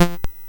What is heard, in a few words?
Mini Golf, MOS6581R4